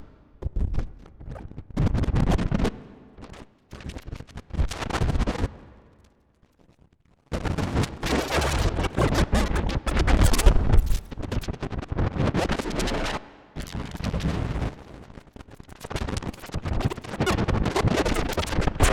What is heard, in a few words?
noise